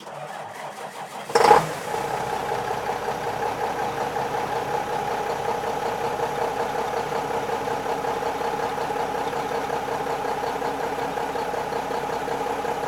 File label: Semi Truck Mac; Large Vehicles; Blastwave